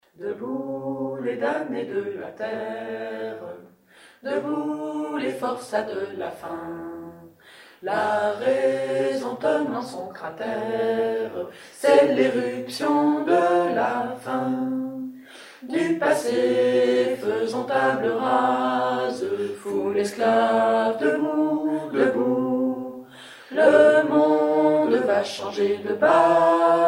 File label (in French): medium tete; Internationale